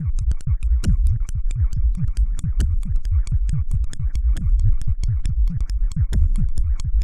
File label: Synth Bass; Bass X